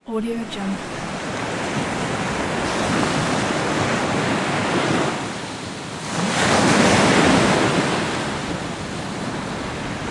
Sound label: ocean